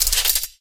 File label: cloth foley; Portal 2; Source Engine; video games; coop